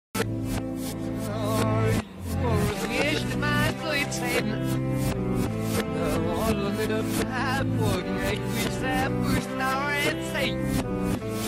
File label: reverse